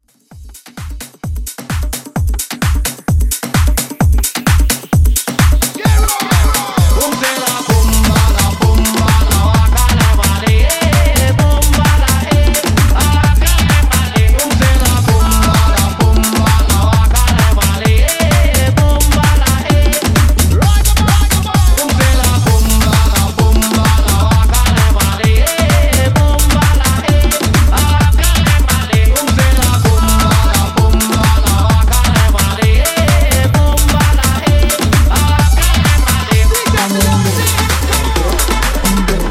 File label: Afro House 130Bpm